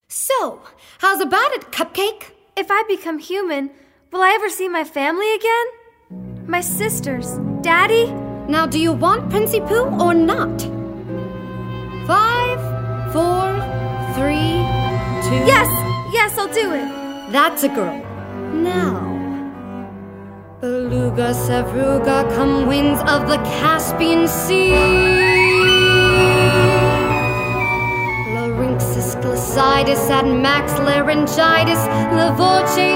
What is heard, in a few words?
vocals